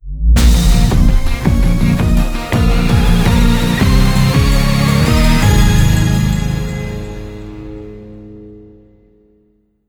You Lose; Rival Wheels

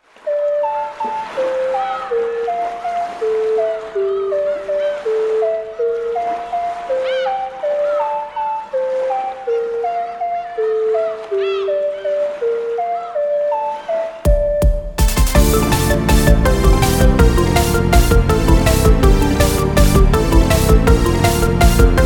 Sea Shanties Over the Waves and Wind

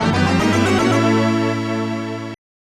Potion; Prince of Persia; SNES

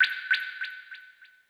Communication Error

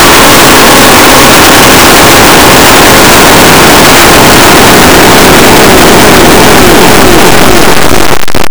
jump; mortimer

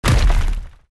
Pillar Impact

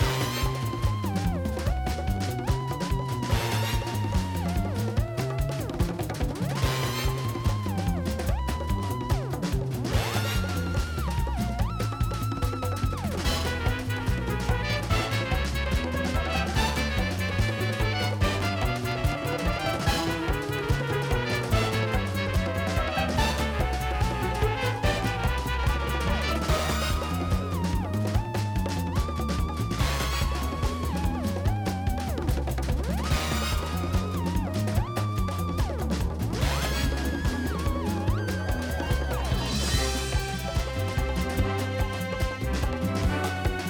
Space Groove Loop

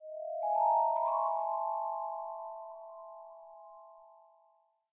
Jingle Cellar; Year Walk

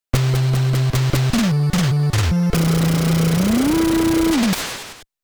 Jingle, NES; baseball starts 1 2

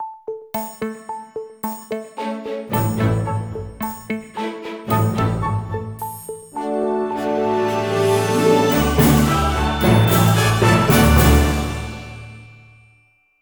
Fireworks Music

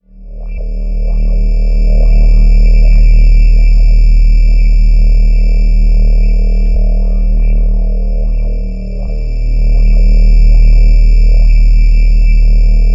Sci FI Amb

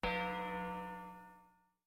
Use Bonus Shuffle; mahjong royal towers ios macos ps vita ps4 windows gamerip 2013

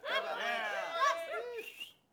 Small Cheer; the princess and the frog; Orleans; Music Voodoo

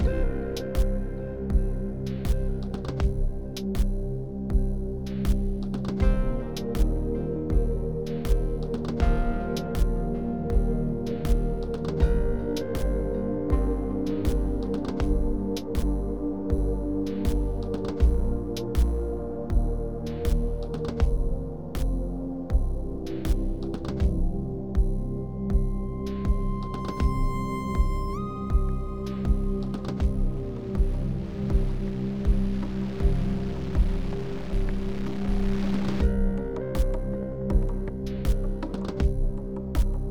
Exploration 8CH 80BPM; Downtown Act2

